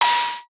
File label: can open pop